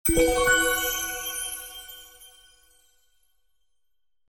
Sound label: play button click; lumeno